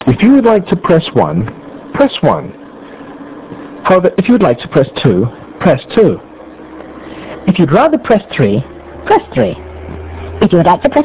Annoying

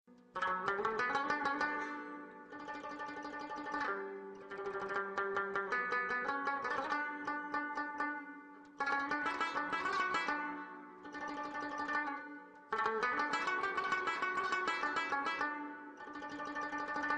Afghani Sitar

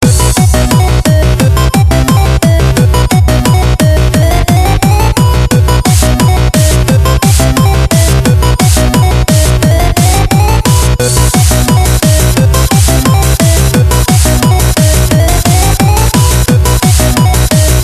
Pacman Techno

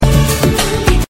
Sms

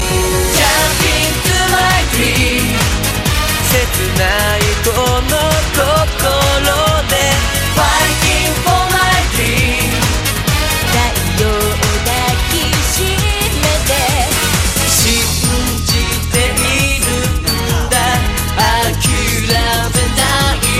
Ultra Music Power

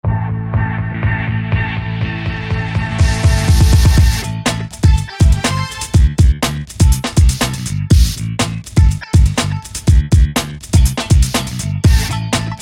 Funky Break

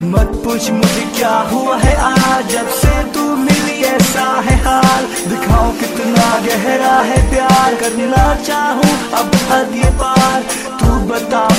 Indian Rap

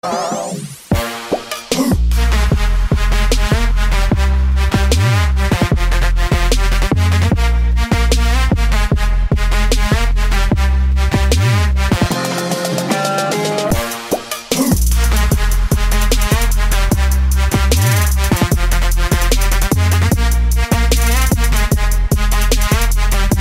Turkish Trap

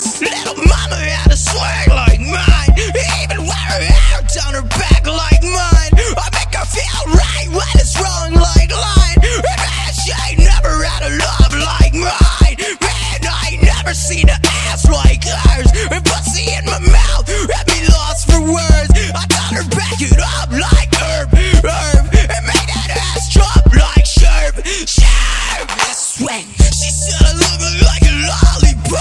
Screamo

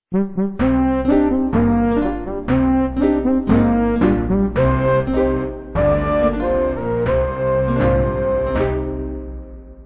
Game Over; Kq1

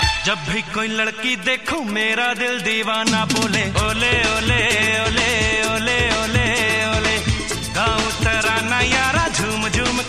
Funny Ole Ole

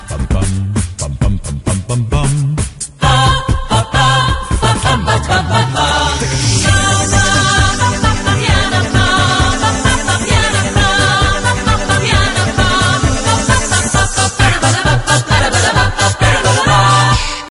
Clasical

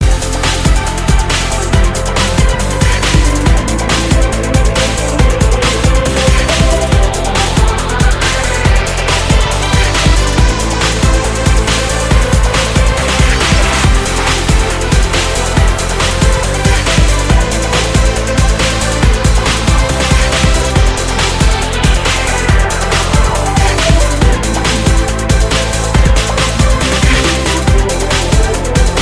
Breakbeatz

